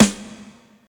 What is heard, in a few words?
big snare drum sound g sharp key